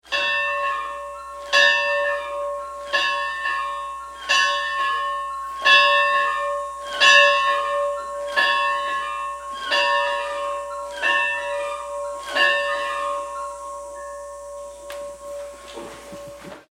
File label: Chapel Bell Toll - Interior single tolls with rope pulls - interior; Bells; Musical